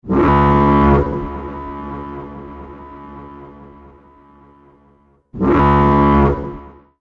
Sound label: Emergency Alert System